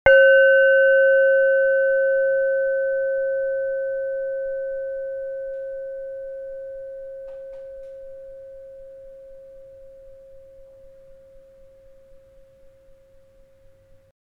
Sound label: tibetan bell sound; Prakash